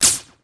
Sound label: drone laser